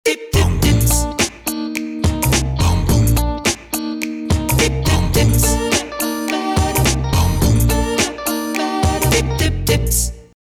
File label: Jingle